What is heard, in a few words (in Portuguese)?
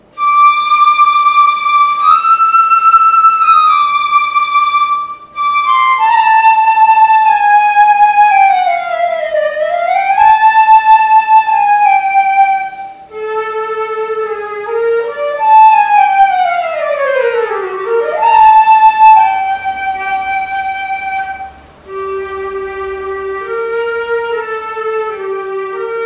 improviso